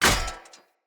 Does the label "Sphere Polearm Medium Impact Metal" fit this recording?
Yes